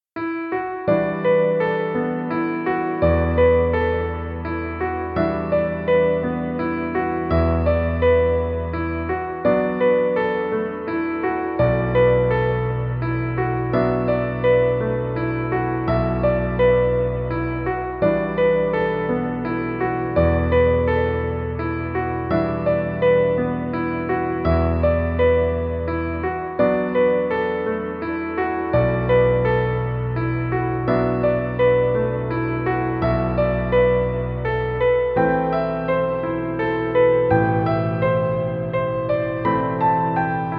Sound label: Amazing Inspiring Piano, Piano music